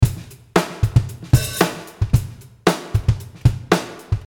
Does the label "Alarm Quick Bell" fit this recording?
No